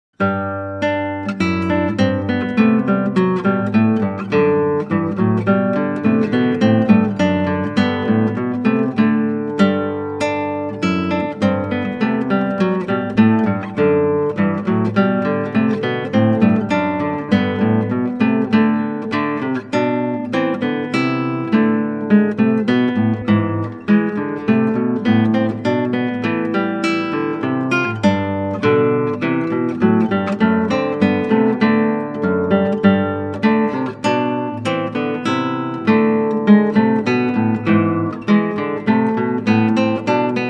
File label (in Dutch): Klassieke Gitaar; Bach 50 Solos